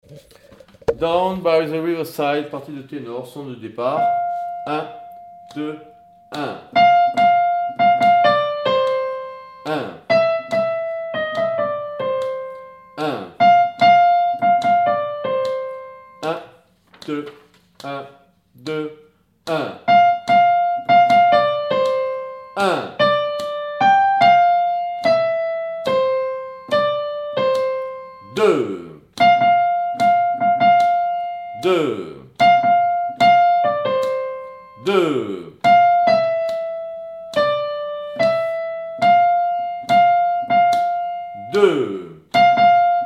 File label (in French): Tenor